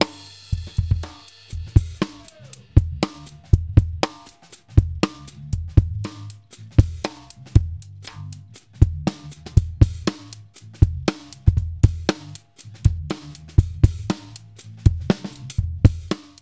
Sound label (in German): Subgruppe Aggressive Bus